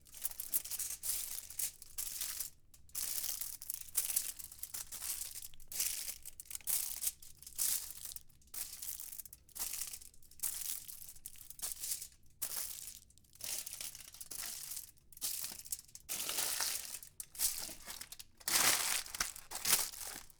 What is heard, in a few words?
aluminium foil